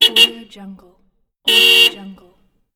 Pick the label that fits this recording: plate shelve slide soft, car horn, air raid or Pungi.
car horn